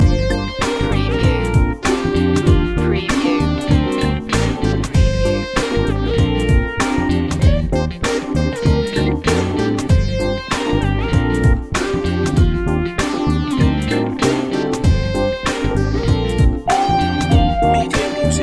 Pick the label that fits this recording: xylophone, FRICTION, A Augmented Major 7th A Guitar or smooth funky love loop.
smooth funky love loop